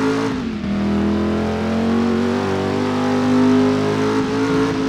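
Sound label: third, car engine; sgmcars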